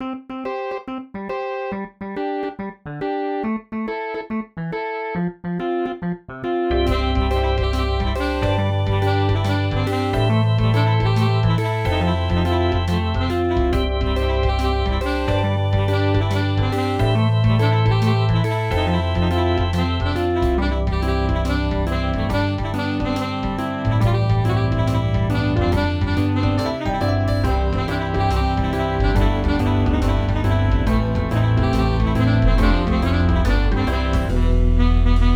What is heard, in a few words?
Super Reggae Remix